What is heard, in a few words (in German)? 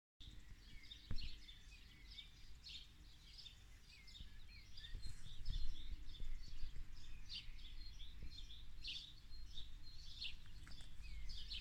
Landleben Geräusche; Modulkoffer